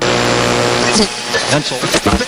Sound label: dead radio; cstrike